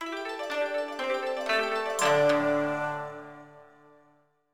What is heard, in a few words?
Jingle, Draw Jingle; Itsudemo Dokodemo Dekiru Igo AI Igo DS; DS gamerip 2006